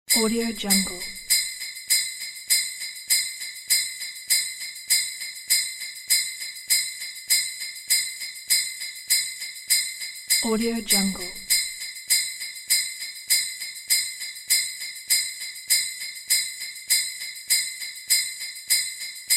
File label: christmas bells